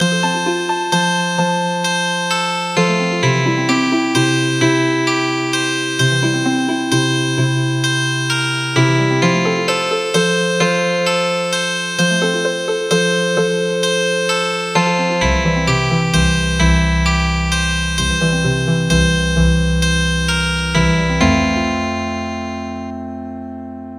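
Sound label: Healing Harp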